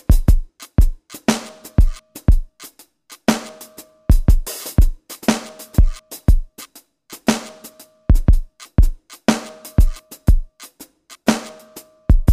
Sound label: 16 bars with hand played hats not quantized